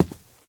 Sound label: grass run, step